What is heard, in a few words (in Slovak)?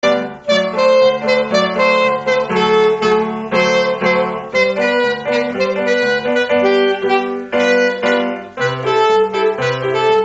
tanec